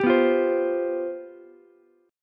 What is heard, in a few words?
G 11th, Ukulele; G11 strummed